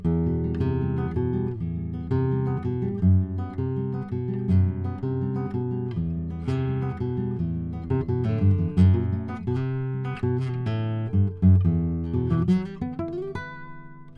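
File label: Guitar Nylon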